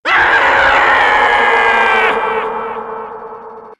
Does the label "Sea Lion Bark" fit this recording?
No